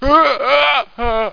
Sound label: oooww; quake